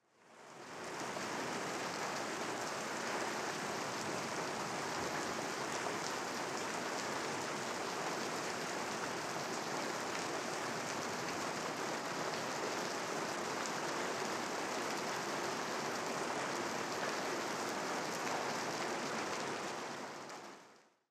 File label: heavy rain on foliage